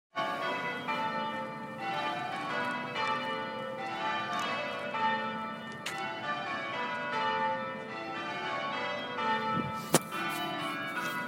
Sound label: rochester bells on byelection night